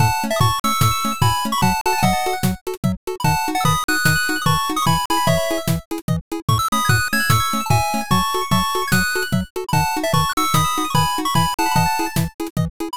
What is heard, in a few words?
bgm bright